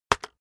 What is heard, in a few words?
keyboard external enter hit fast